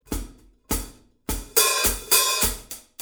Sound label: sabian 14 hh fusion hats